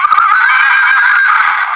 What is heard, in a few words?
horse